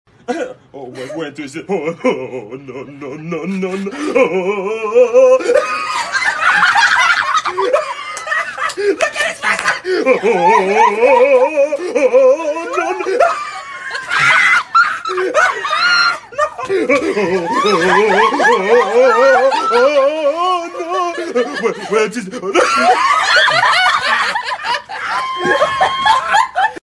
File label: sound of laughter